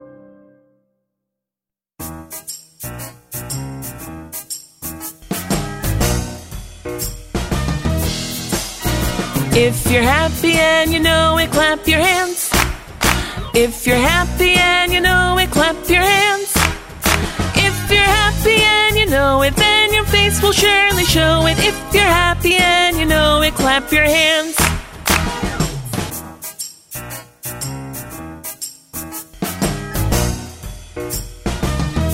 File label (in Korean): 영어 여 노래